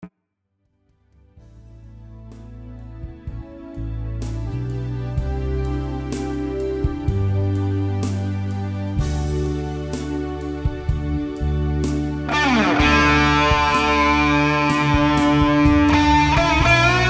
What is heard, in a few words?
Fat Switch Mid Gain CE2